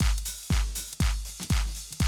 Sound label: 120, Classic Drum Loops